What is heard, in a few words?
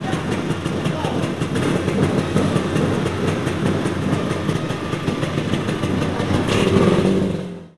Scooter